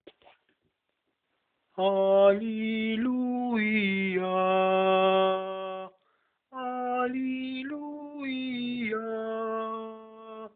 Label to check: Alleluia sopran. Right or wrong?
Right